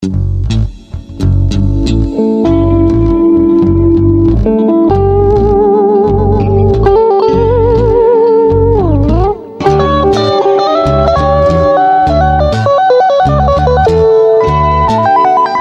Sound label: africa solo